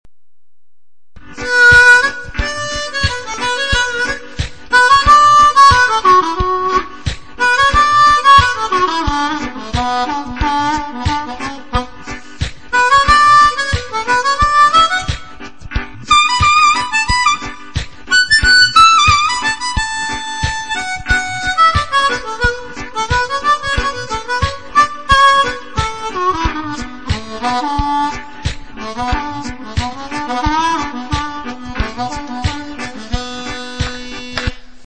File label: 3rd minor, harmonica